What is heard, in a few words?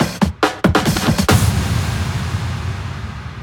Fills, Loops 140 BPM